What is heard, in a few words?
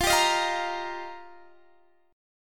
F 7th Suspended 2nd Suspended 4th, Guitar Drop D